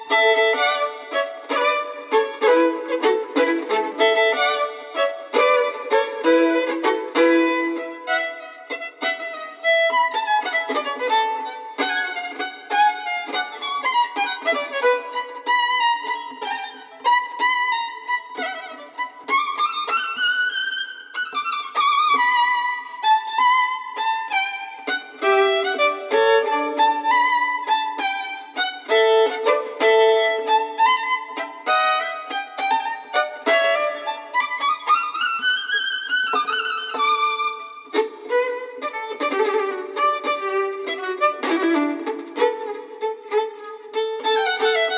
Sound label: Piccola Sonata For Solo Violin In D Major Allegro